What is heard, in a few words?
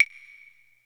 claves